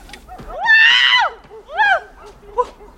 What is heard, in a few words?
GIRL SCREAM WITH DOG BARK IN THE BACKGROUND